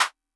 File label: clap; MFB-522